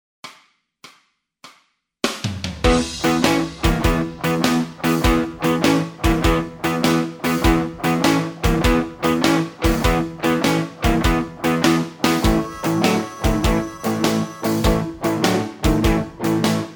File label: bass blues, backing tracks